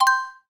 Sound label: shelter alarm on; Original TK Sounds